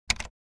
Bluetooth keyboard keystroke pressing enter key

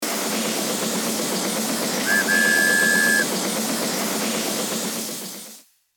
Toy train movement whistle blow; rc toys

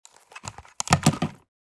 Headphones set down; headphones and speakers

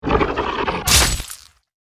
Werewolf claw slash strike

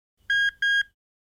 Home office printer beeping

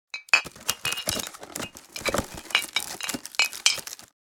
Wicker basket movement bottle clinks inside

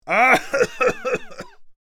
Sick man coughing

